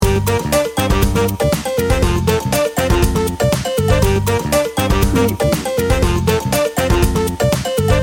tropical salsa party loop

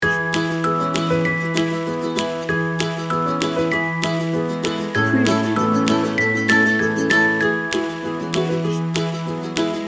pure magic loop

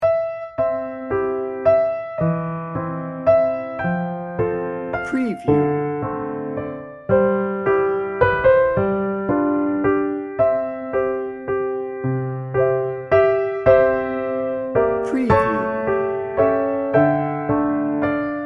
oh little town of bethlehem

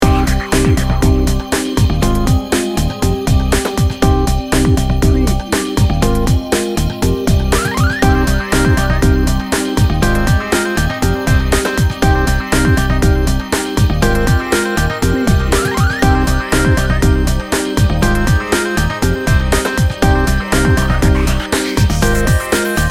energetic motion

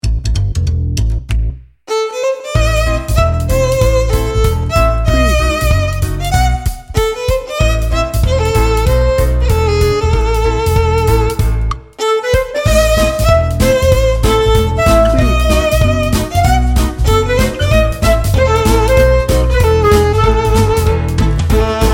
gypsy fiddle jazz

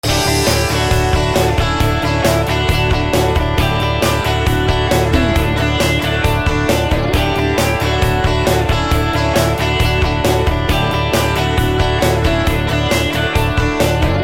fresh and friendly pop loop

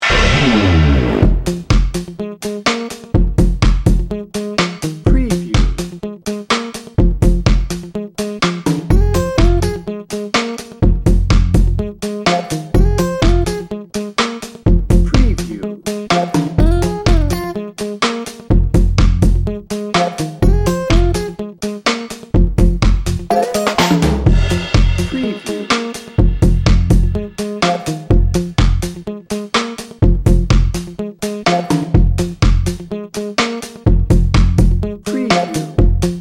party player no horns

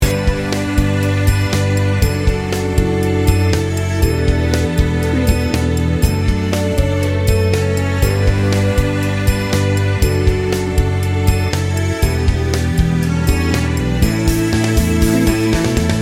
smooth and inspiring loop

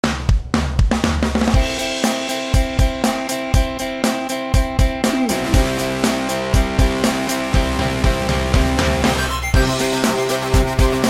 party pop rock

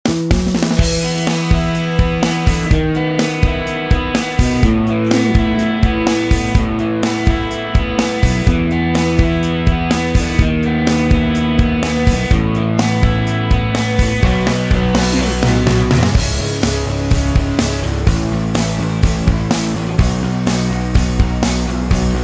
reflective and hopeful bed

